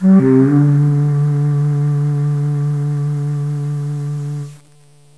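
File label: shakuhachi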